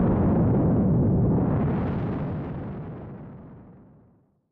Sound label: rocket Deploy